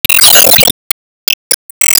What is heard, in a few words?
Enemy Road mechanic Active Hit